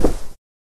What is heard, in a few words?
cloth; MineClone2; mcl sounds